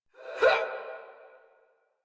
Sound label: Attack Effort; Warframe; Dax; Ranged